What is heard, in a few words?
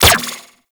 Laser Fire; Warframe; Corpus; Riot Moa